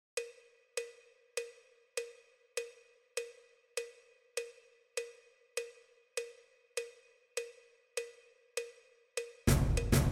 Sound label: perc